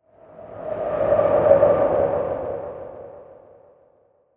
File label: SFX HALLOWEEN GHOST WHOOSH